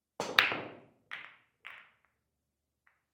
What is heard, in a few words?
Pool Break